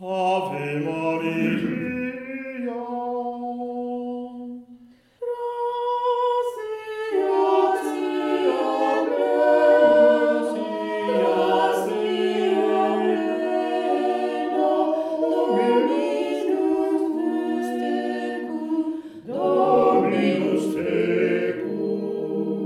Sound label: ave maria victoria